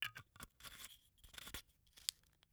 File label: Bottle Cap Screwing On Glass Bottle; Foley